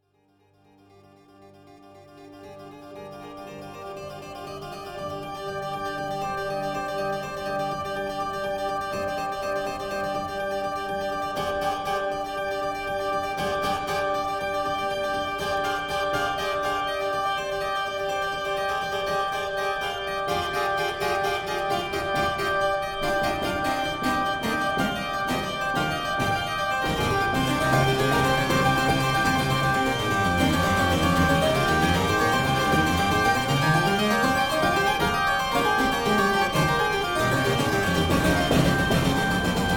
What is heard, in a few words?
Phrygian Tucket